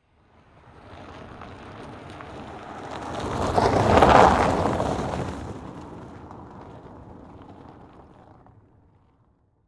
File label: Tires Pass By Snow, Transportation, Automobile, Tires, Pass, By, Snow, Ice, Crack, Slow, No, Engine, Saturn, Vue; Car; BlastWave